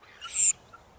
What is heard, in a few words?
redw coburni MS; kaldbakur